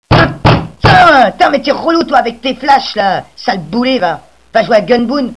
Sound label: flash; cstrike; proxima